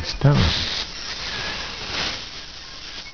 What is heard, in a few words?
stoned; quakerepo fortress misc